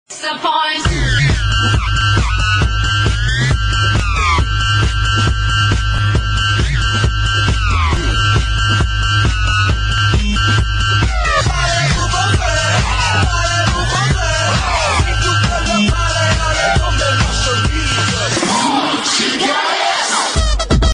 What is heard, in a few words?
electro house jumpstyle